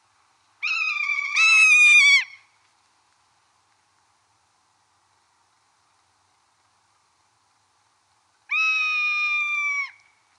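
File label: zimnyak golos, rough-legged buzzard voice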